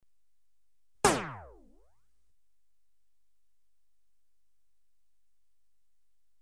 Variable velocity gun (single shot), Electronically Generated Sounds; Sound Effects Library